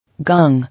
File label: Gong